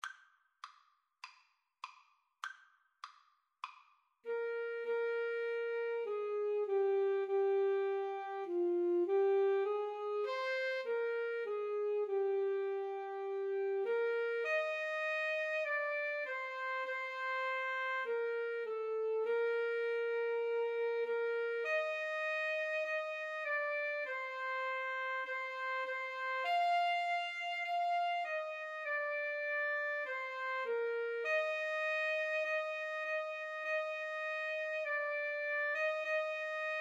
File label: it is well, Saxophone Cello Duet; as, vc